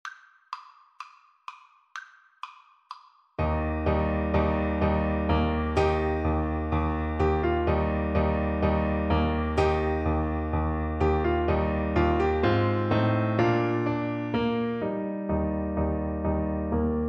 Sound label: Baritone Saxophone